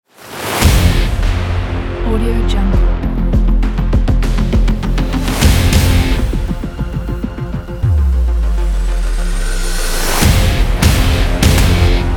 epic rock trailer ident

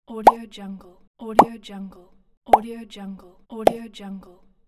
bloop pop

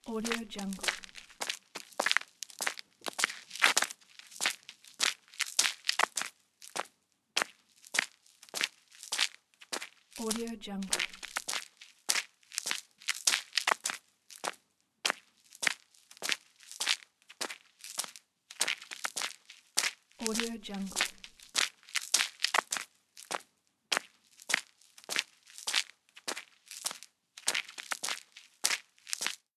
the steps

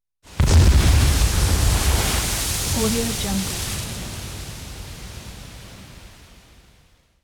wave spraying; prosoundfx

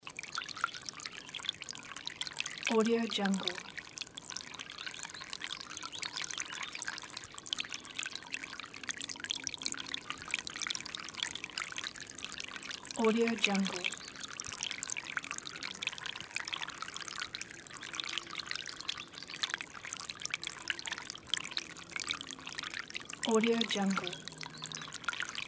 stream water loop

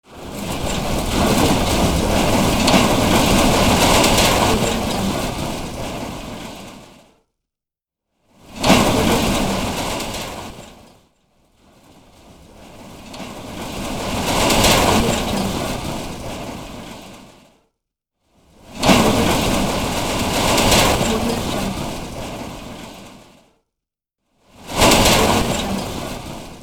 a lot of metal and debris is falling

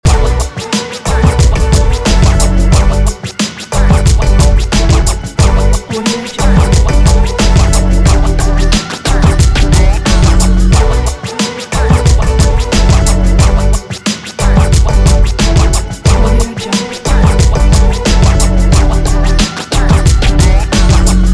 hip hop

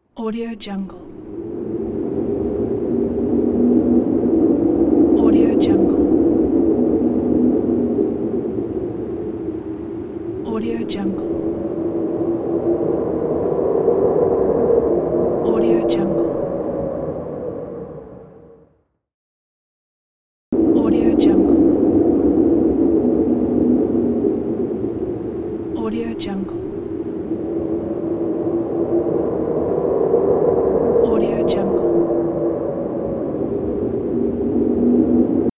wind noise

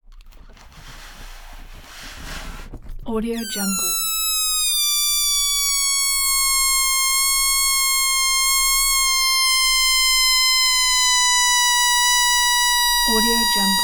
balloon squeal; prosoundfx

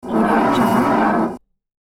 manhole cover dragging; hollywood edge

